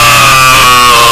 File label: Pain Scream; Frisk